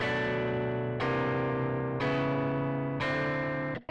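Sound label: guitar chords, Hybrid neural guitar overdrive; souldriven; Morphdrive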